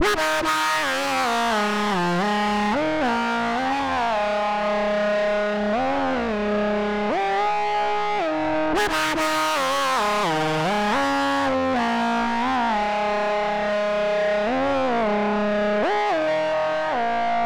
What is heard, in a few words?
MELODY